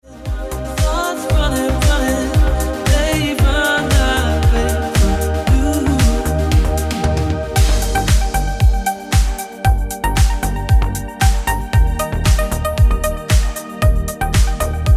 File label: Ringtone